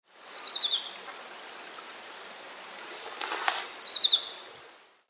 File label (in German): Roter Panda Ailurus fulgens; Tierstimmen